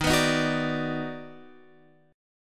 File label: D sharp Minor Major 7th Sharp 5th, Guitar Open F; strummed